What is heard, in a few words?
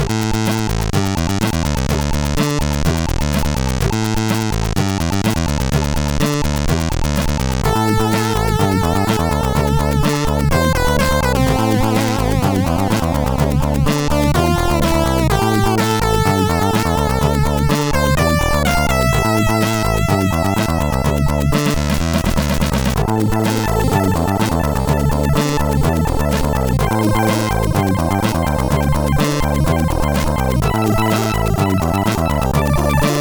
Tetris crackintro sid CSG8580R5